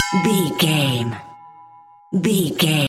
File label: bell med ringing